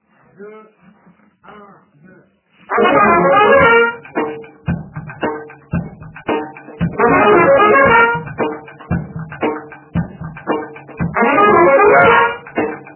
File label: bougalou, repet